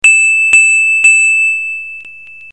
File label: Android 3 chimes